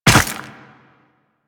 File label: Land Ice, Footsteps; Warframe; Tenno